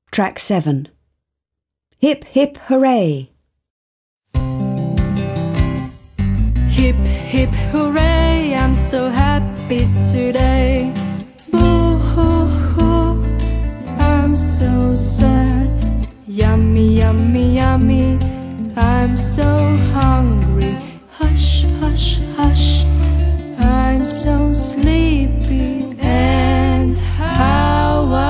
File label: Hip hip hooray